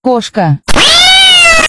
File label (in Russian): koshka miauu